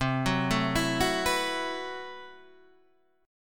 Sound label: A Minor 6th Add 9th C, Chord